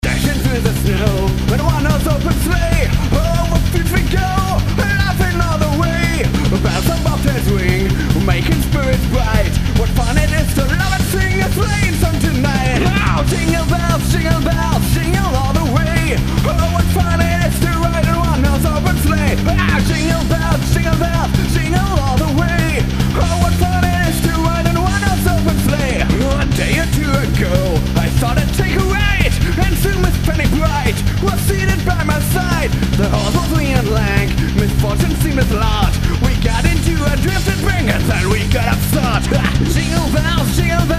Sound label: jingle bells